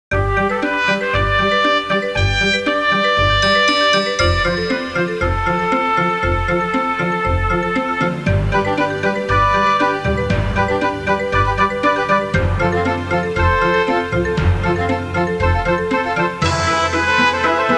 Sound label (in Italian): suonerie contemporanea; musica contemporary